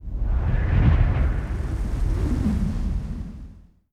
Gale Wind Start; Mountains; Journey

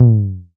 Tom MKS, Drums

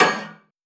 Perc Pot, One Shots, Drums, Misc Percussion

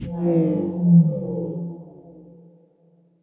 Ghost Impact

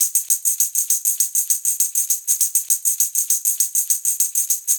Tamb 100 bpm; Loops; Drums; Percussion; Snoopy; Ableton Live 12 Lite; Core Library

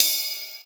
Ride Acoustic; One Shots; Drums; Yakiman

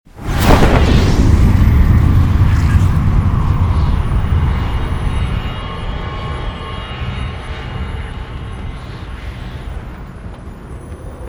Summit Intro Player Take Off; Journey

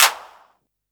Clap; Ableton Live 12 Lite; Core Library; Drums

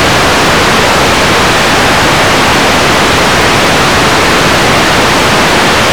Noise Pink Noise; Ableton Live 12 Lite; Vector; Sprites

